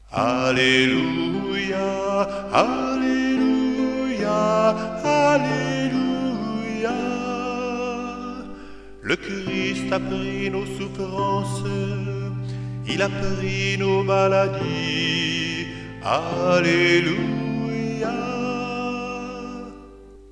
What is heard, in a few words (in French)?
Alleluia pascal